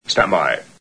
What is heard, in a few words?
standby